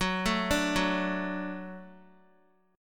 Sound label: F sharp Minor Sharp 5th F sharp Guitar; arpeggiated; JGuitar